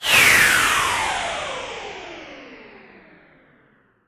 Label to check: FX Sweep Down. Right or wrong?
Right